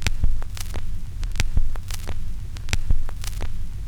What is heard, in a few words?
CRACK, CRACKL FX; E-Lab; X Static Goldmine 2; akai